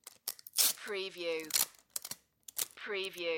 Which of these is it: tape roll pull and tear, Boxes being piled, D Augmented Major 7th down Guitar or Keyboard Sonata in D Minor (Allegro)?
tape roll pull and tear